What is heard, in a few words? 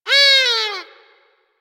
Forest Animal Cries